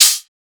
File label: Open Hihat, Techno Drum Shots; Drum One-Shots; GhostHack; Ghosthack - Free Techno Drum Shots 2024